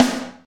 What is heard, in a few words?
tight lows acoustic snare sound c key